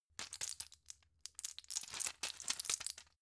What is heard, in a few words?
plastic blister handle long